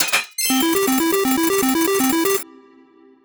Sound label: casino slot machine